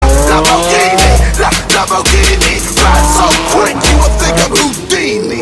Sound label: lamborghini song remix